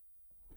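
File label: Contrabass DI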